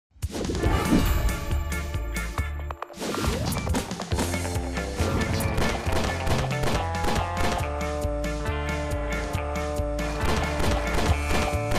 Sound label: Gatling Gun; Disney Infinity